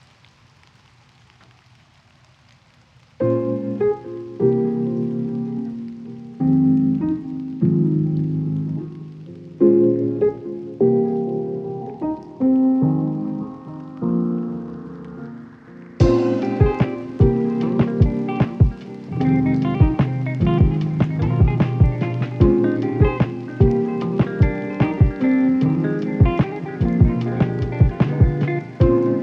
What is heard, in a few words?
Lofi